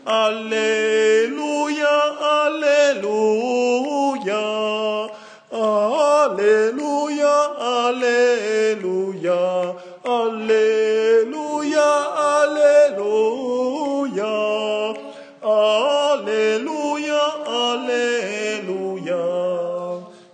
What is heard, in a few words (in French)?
alléluia ténor